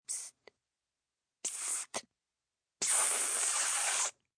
Psst Psst Psst, 3 Psst's, progressively louder, Whispering